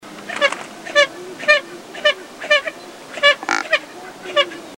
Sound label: Andean coot